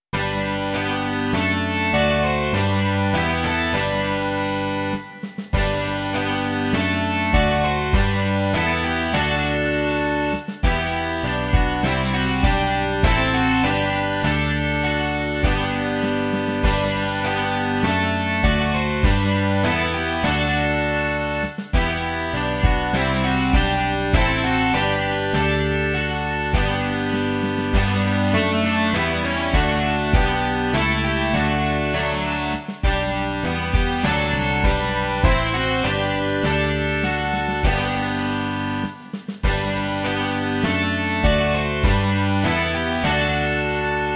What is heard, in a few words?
rock